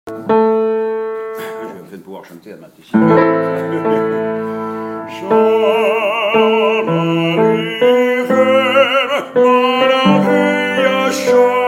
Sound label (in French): hommes